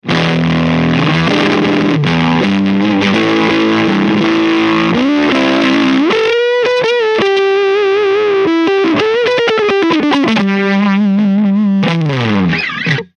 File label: Budda superfuzz strato